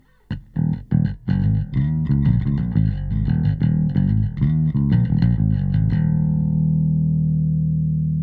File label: bass; Pulsar